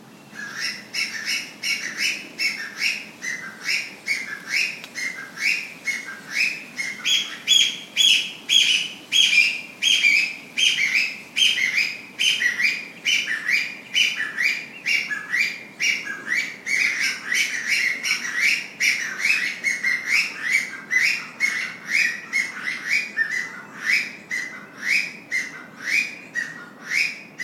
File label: Chinese Bamboo Partridge